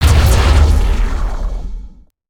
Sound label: Spider Boss Ground Slam; creatures mechanical